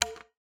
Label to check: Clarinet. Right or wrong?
Right